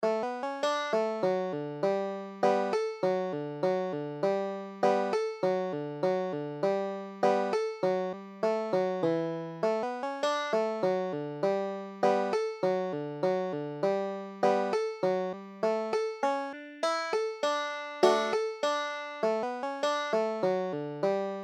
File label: hornpipe, banjo